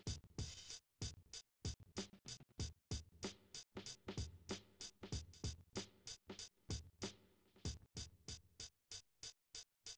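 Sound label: with drums; SiSEC11; underdetermined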